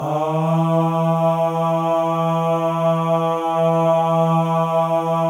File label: MALE AHS; Spectrasonics; Symphony of Voices